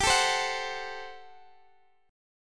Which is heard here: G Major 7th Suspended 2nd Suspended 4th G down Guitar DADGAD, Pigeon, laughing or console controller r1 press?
G Major 7th Suspended 2nd Suspended 4th G down Guitar DADGAD